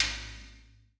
Value Button; godsoffortune

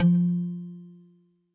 button click; happy riches

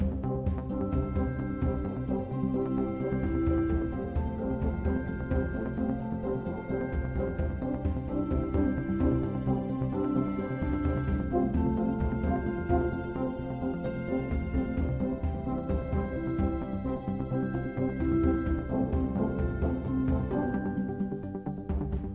spin Music; strollingstaxx

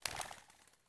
bird fly away; the wolfs night

